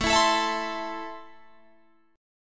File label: A Minor, Guitar, Open G